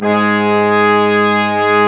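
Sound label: BRS Quintet long, brass quintet; Roland L-CDX-03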